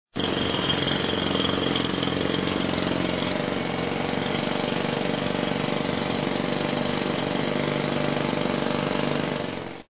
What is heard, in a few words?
jackhammer; Machinery; Jackhammer 6